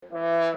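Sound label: trombone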